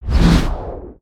Full Swing; Summoner Valiant

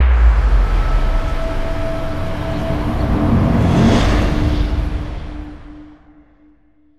Pet Rock Binoc, ambience

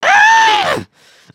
Angry, emotes

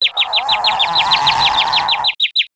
stun birds; creatures organic

